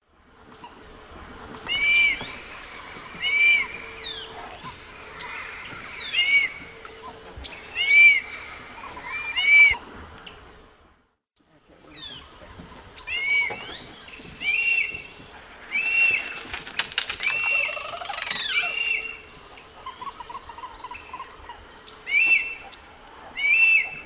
Busarellus nigricollis, calls; S. America; Eastern Lowlands

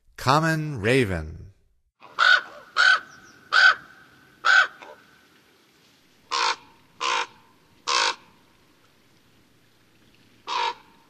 Common Raven, Songs of Birds of North America; Stokes

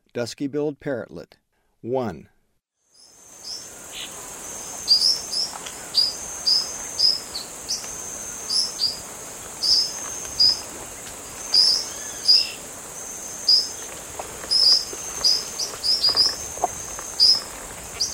Dusky-billed Parrotlet; S. America; Eastern Lowlands

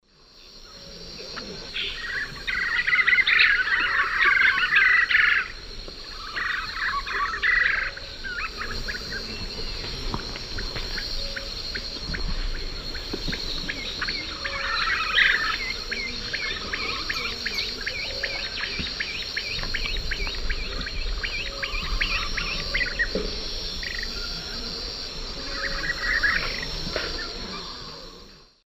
Monasa nigrifrons, calls and subsong; S. America; Eastern Lowlands

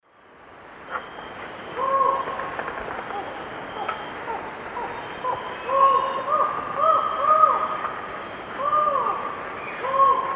Micrastur semitorquatus, song 2 birds; S. America; Eastern Lowlands

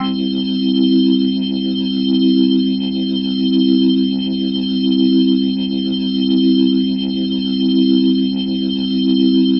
Glowing Organ C Maj; Major; The Vitale Organ

